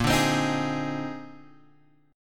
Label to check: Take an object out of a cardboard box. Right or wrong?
Wrong